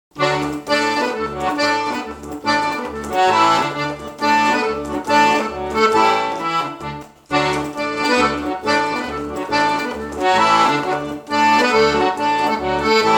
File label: polka